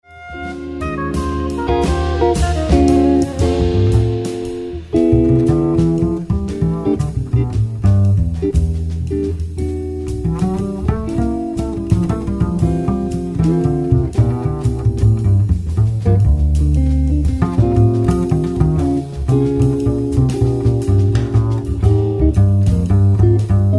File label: Waltz